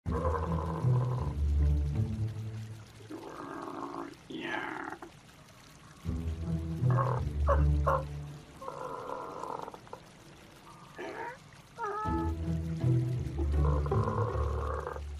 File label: Ambience; Hollow Knight Ambience; Leg Eater Ambience